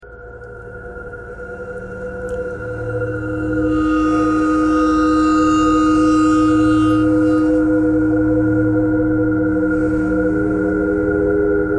ice bowed vibes traffic k core birds thread pentatonic; bowed metal